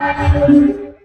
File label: Menu Synth Tone; Menu FX